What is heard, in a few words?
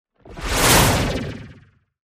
Whoosh Fused Small